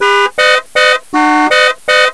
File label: horn